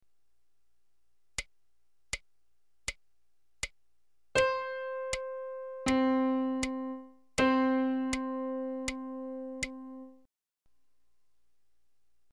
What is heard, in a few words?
interval